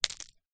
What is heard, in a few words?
impact stone small drop on stoney ground